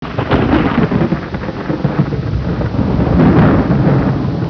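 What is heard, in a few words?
thunder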